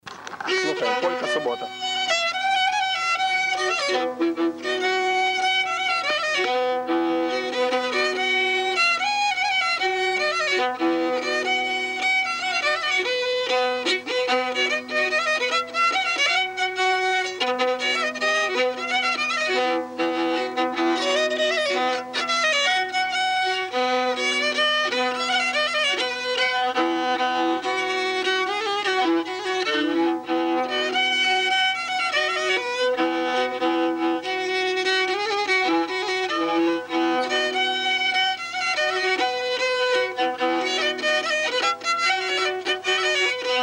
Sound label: polka; subota